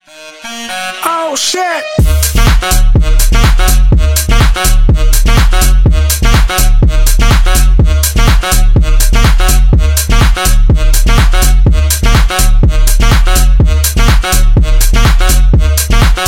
sax, саксофон

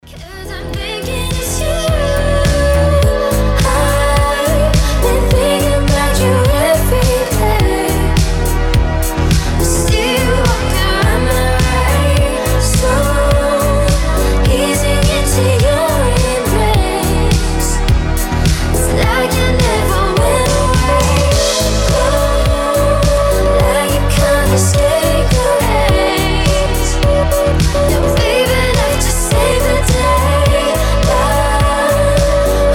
extended mix, deep house

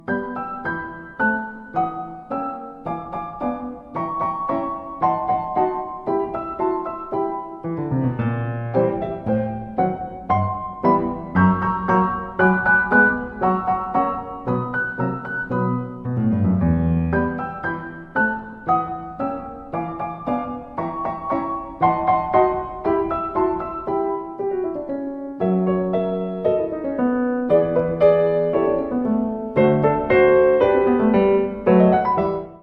dance of the sugar plum fairy piano; klassika

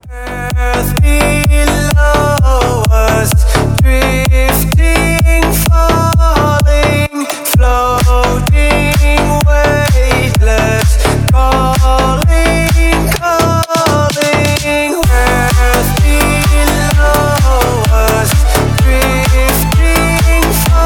hyperclap

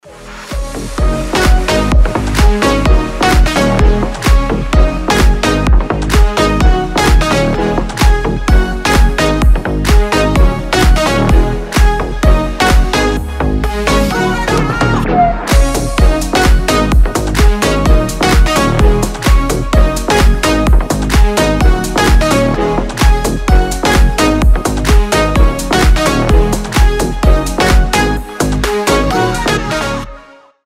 waves of energy, slap house

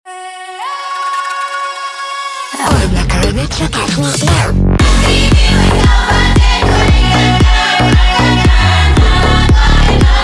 slap house

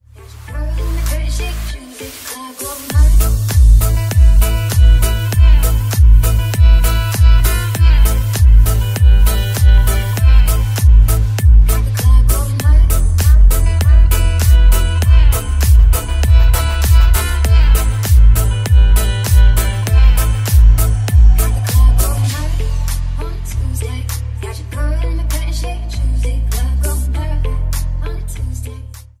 bass boosted

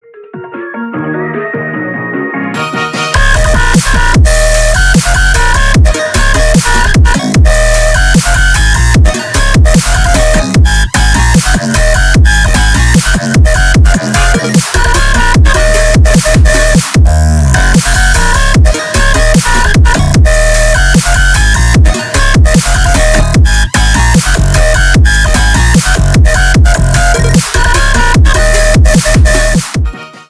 dubstep remix; toothless dancing meme; deti-multfilmy

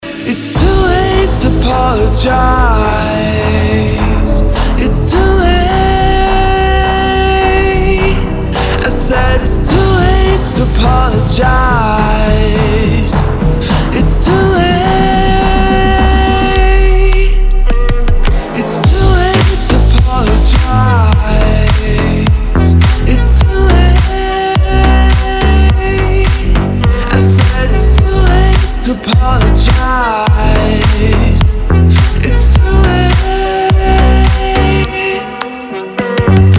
slowed reverb; tantsevalnye

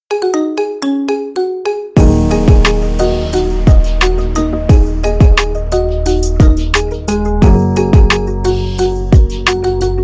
marimba remix

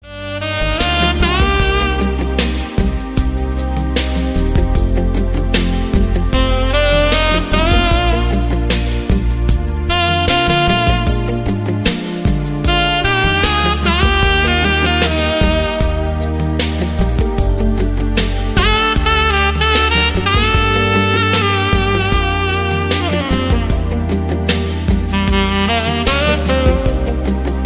the saxophone cover; dzhaz

